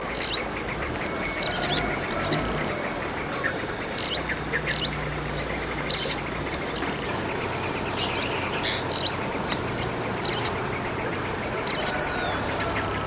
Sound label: Pacfic Swallow calls; Amar